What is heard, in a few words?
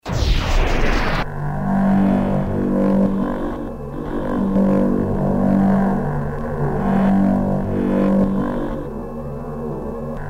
music